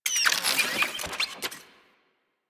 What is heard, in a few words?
Drone Switch In; Warframe; Deimos; Cephalon Drone